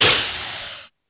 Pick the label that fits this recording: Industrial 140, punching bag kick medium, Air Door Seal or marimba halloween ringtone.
Air Door Seal